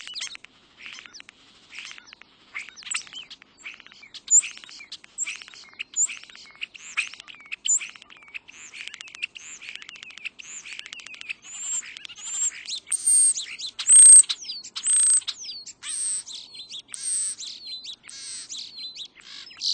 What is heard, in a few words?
European Starling; Birds